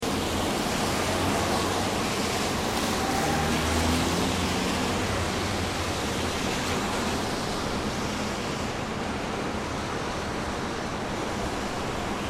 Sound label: City Traffic Wet Streets, Close to traffic, cars and trucks; drive by on wet tarmac